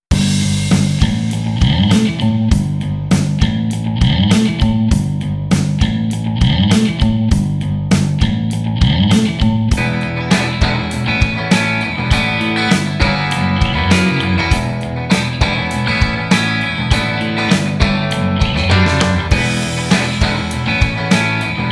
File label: Blues Rock Steady Strummer 100